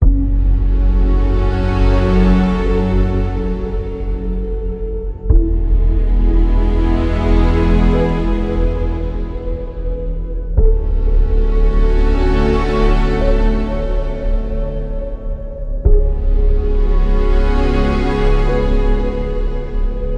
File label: Cinematic Ambient; movie trailer